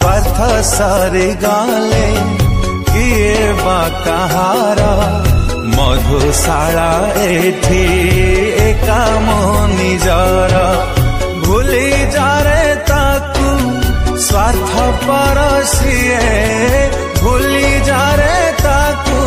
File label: Sad Odia Song Ringtone